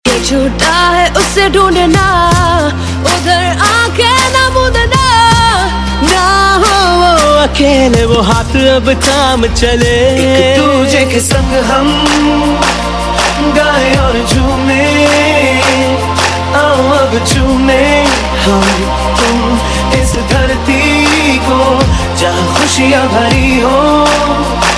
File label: Hindi Ringtone, Bollywood Punjabi Pop